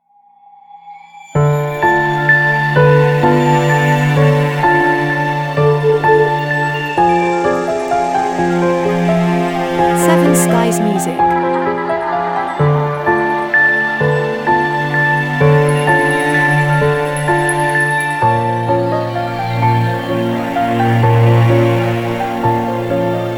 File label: Haunting TV Theme